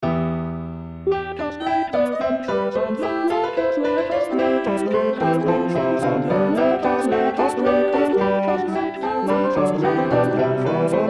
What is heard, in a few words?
Bass